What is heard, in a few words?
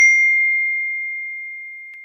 iphone sound message